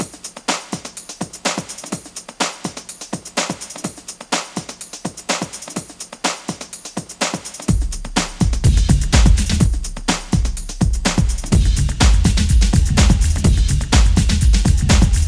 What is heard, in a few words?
breakbeat riff